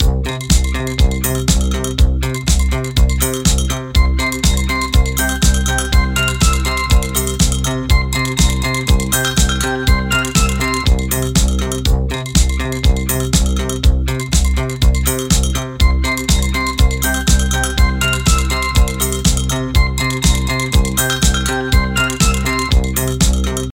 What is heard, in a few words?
Sound Of Disco